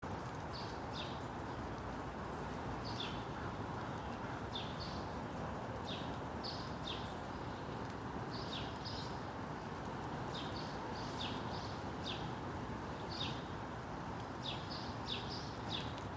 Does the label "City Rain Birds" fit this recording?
Yes